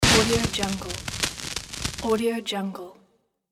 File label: gunpowder ignition